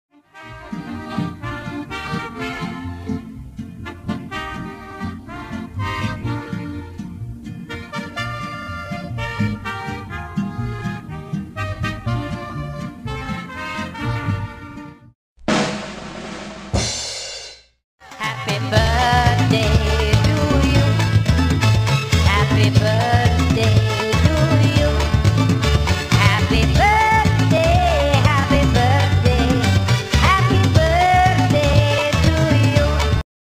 Funny Happy Birthday Song. Monkeys sing Happy Birthday To You